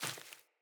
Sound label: Sandals Run Vegetation, Footsteps; Player